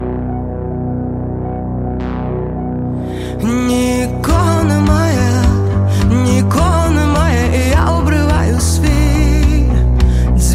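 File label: most famous pop song tone ringtone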